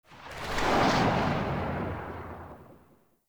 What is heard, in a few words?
Infested Shotgun Tail; Weapons